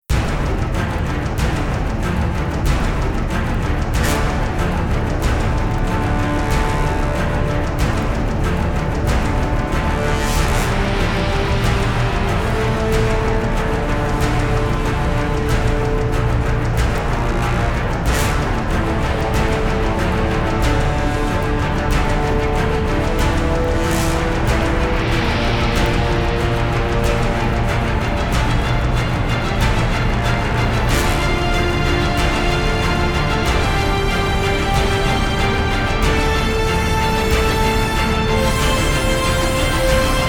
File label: (No Choir), Orchestra